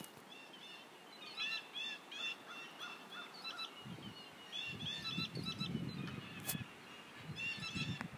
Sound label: the squeaky clothesline bird